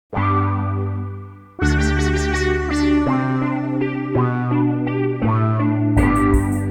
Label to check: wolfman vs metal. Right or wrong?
Wrong